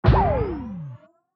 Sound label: jet start